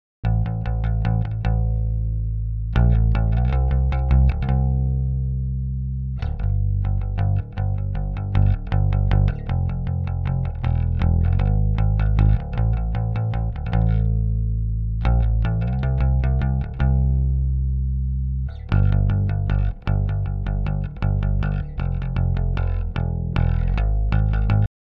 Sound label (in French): basse